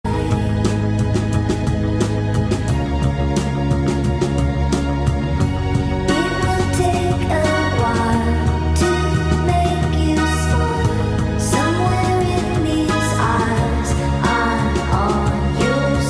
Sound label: song sped up; sad edit